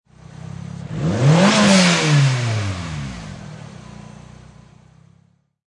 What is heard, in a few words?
Mitsubishi Eclipse Engine Single Rev; Auto, Power Car, High Performance Car Sound: Mitsubishi Eclipse; Engine perspective; Revving, single motor rev; Lynne Music